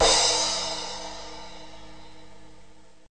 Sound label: cymbals; Mount&Blade Warband